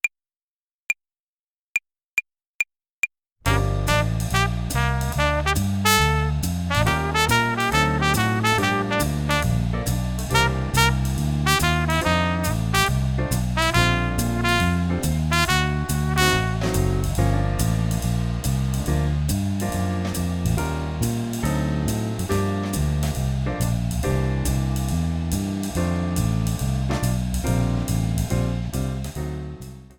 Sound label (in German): Swing-Phrasierung Übung 6, Play-along, Trompete; Jazz Club